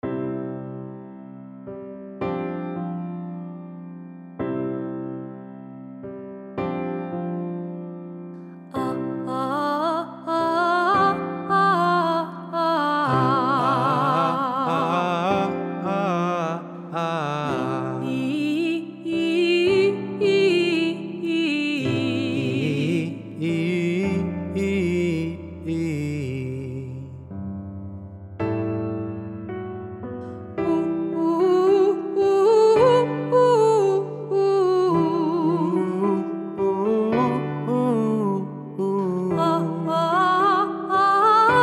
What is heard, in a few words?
exercise male voice